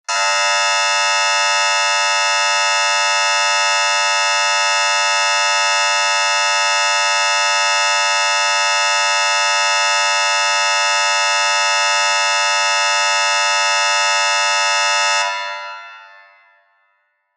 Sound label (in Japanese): 劇場やホールの開演ブザー 15秒 リバーブ有り; 「演劇・舞台」の効果音